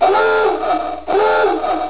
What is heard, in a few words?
dive alarm